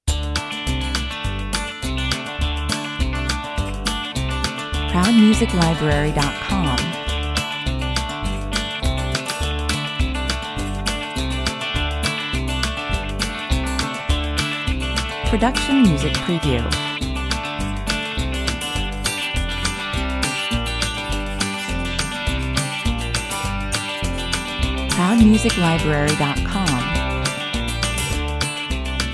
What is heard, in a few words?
Mid-tempo country with twangy guitars and a travelling / train style bass l, 103 bpm